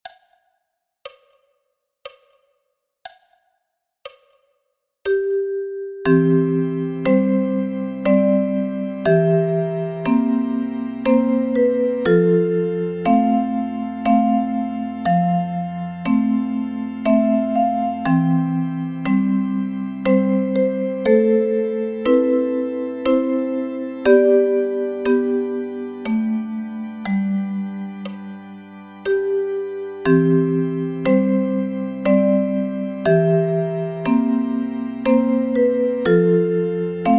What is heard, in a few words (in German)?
Uke und Bariton Sound, Ukulele & Baritone Ukulele; Home on the range; Ukulele Duets; 12 American Folk Songs